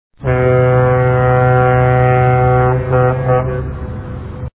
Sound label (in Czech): lodni sirena